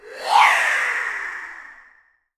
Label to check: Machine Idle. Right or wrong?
Wrong